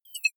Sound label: hover high tonal